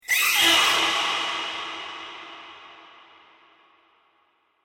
Transition Horror Whoosh Slash Scary Metallic, Transition Metallic Whoosh Slash Scary Airy; Vocal Horror; Sound Design; Whooshes; BBFX